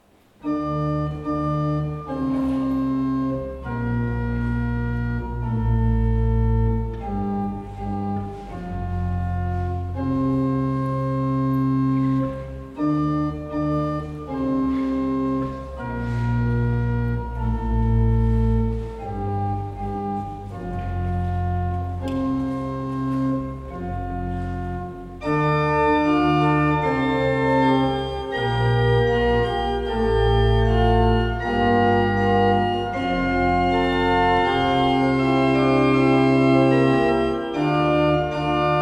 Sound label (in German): Chaconne in F Improvisation, orgel